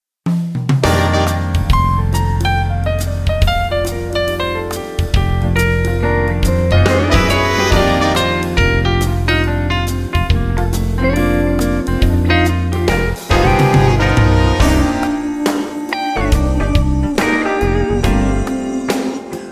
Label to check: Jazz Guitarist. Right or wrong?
Right